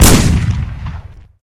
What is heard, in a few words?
Shotgun Fire; Pain Train